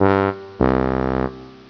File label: bassoon; Windows